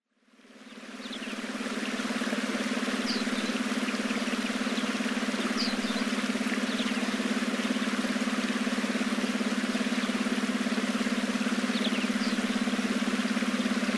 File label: meditation